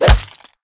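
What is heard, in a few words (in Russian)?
chuvstvitelnyi molnienosnyi udar kholodnym oruzhiem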